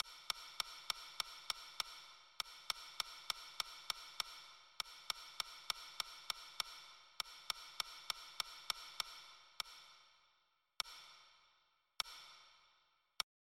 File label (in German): regen regen tropf tropf tropf